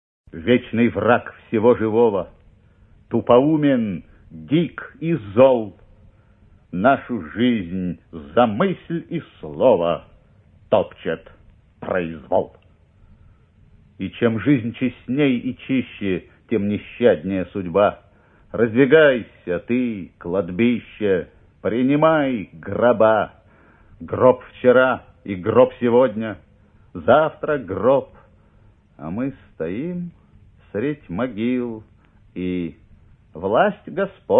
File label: pad